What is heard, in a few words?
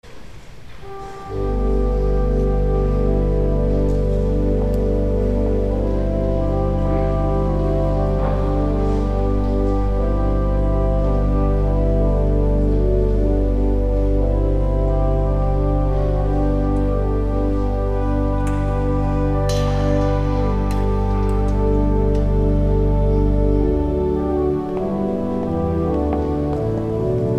Organ; St. John's Cathedral, Jacksonville Florida